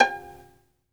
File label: Synclavier Strings, SOLO VI; ILIO